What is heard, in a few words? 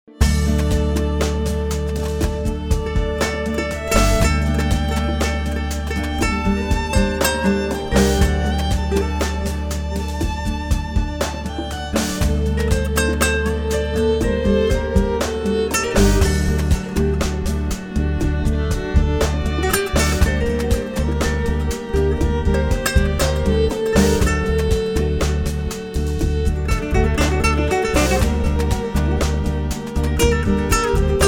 Chilled Violin and Guitar